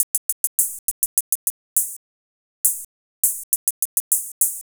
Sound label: Hats 102, Percussion Elements